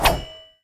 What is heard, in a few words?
sweep, player attack; Minecraft; Conquest Default